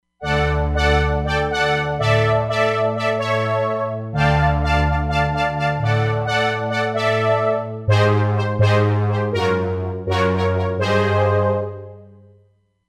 Saw Brass, Roland Fantom XR

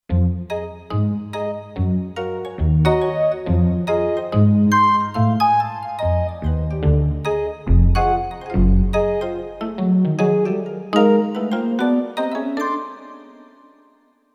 Pluck synths, Kurzweil K2500X

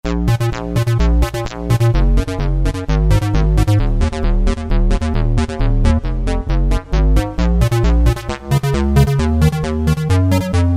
Square Bass, Korg N364; Factory Prog demos

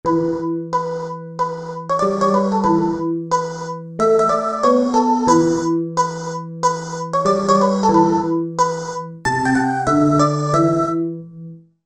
2 voices; Korg MSC-12S Synth 3

